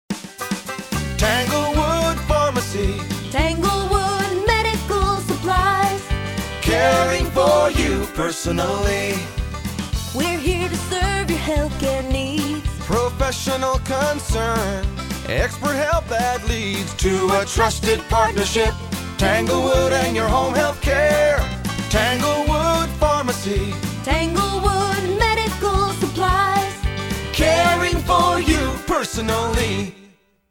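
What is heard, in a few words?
pharmacy jingles, acapella jingles; Tanglewood Pharmacy; Medical Supplies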